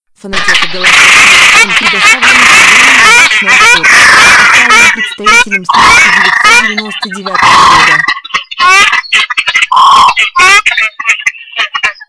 Огарь

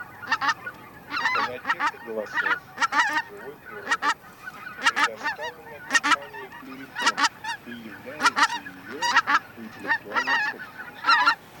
Белолобый базар Oche Lombardelle; chip mini 234 plurifon

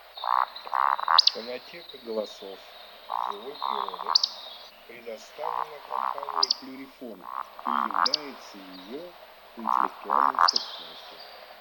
Вальдшнеп Beccaccia

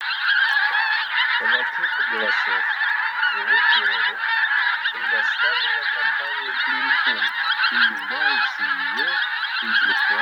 Гуменник к клюв базар

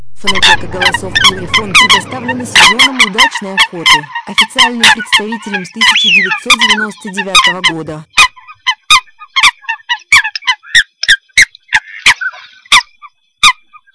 Лысуха

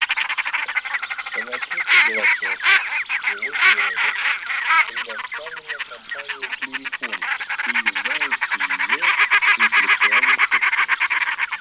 Пеганка; Volpoca; Rossiya

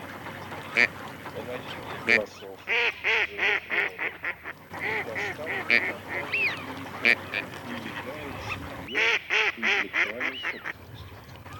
Серая утка Canapiglia; plurifon

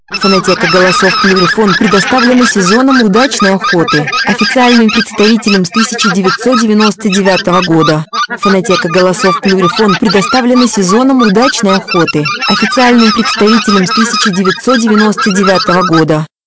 гуменник; plurifon

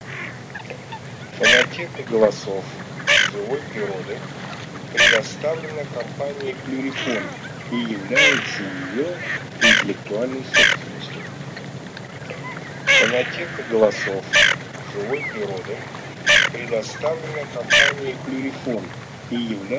Чернеть Хохлатая Moretta; plurifon

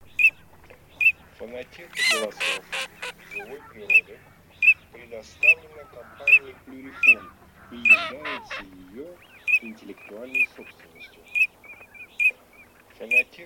Чирок-свистунок Alzavola; plurifon

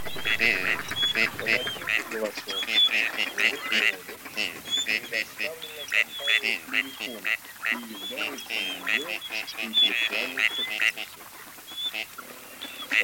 Серая утка Canapiglia; plurifon

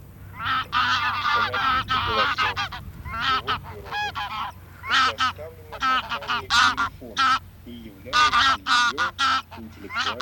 Гусь серый базар Oche Selvatiche; plurifon

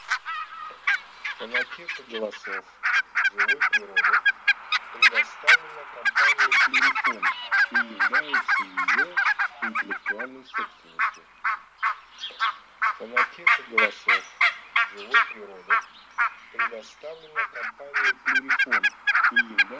Казарка белощекая Oca Faccia Bianca

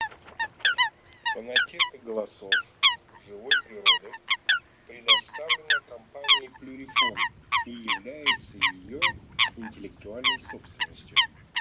Лысуха Folaga; plurifon

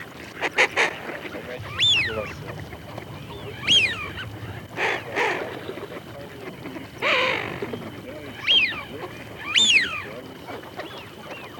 Свиязь Fischione; plurifon

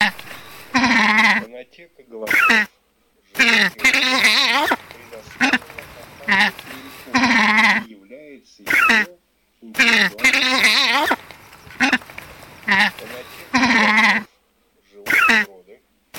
Турпан горб Orco marino dagli occhiali; plurifon

